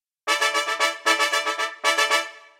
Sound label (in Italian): squilli trombe